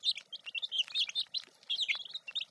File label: Chicken Run Loop